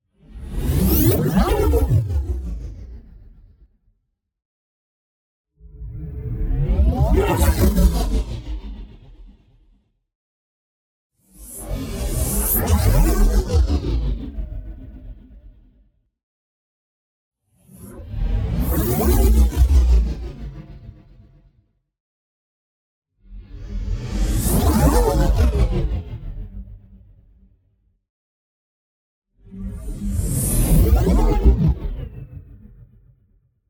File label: NEON WHOOSH LARGE SLOW Energy Drain; boom library